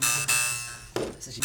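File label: PLK Bass Mbira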